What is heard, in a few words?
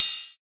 crunchy kit cym; STYLE KITS (Hip Hop, Etc)